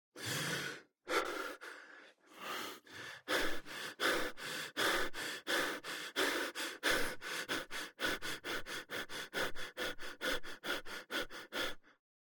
HUMAN BREATH Male Deep Mouth Inhale and Powerful Strong Exhale with Acceleration; Articulated Sounds; Human Male Breathe